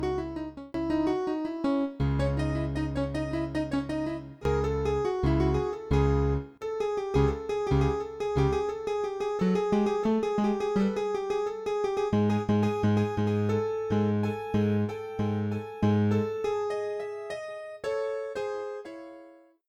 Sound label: grand piano